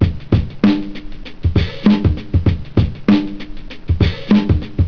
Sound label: drum